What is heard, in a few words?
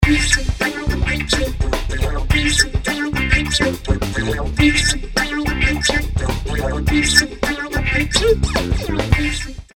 vocoder nature pwm; els vocoder eiosis